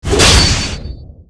Knight Skill; Gamigo

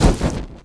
Fire Dmg

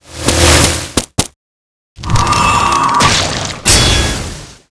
Die; Seer; GamigoNA

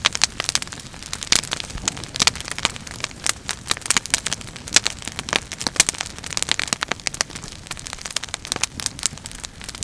Fire

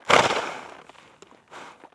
goat action

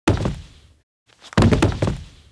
Mantrap Die; Seer; GamigoNA

